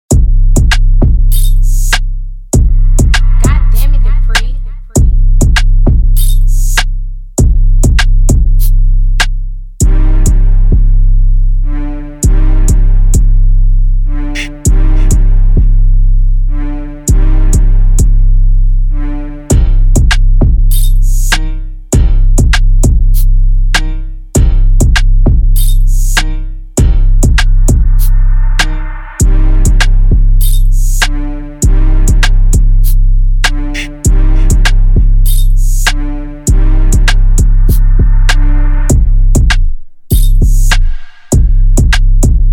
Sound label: Instrumental